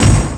Clank, Industrial; One Shots; Drums; Misc; Sonic Foundry (Sony Creative Software)

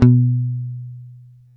B 3, go-go mute, bass; Spectrasonics; Bass Legends CD 1

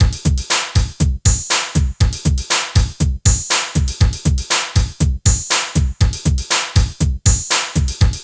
TECHNO; INIS; Funky Xtreams

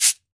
metal cabasa; Brazil

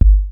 KICK, Hip Hop

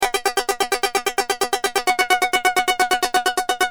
Keys, Industrial

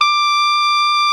TENOR MF D 6, Saxophones; Greytsounds; Philip Wolfe David Crozier's Saxophones

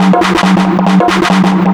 drum, 138, drum-loops, Techno; Techno ID; fatboom

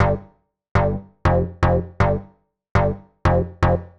Digi Bass (120BPM), retro house

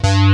SQUARE BASS; Pro-Rec - Synthsonic; BASSES

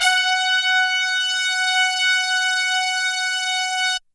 HORNS F#4

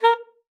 A#4, ALTOSAX; Xsample VOL-2

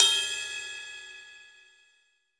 Pop Ride Bell, Cymbals; Roland BK-5

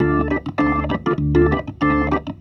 B3 LOOP D

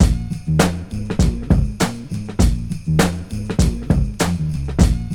BEAT, Beats 100 bpm, Hip Hop; E-MU Formula 4000 Series Vol. 1; Hip Hop Nation

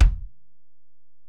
Ac BD, Alesis DM-PRO; 300 Drum Machines

